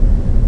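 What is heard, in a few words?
turbine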